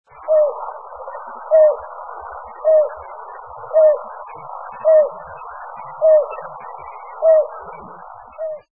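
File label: greater painted-snipe; tamasigi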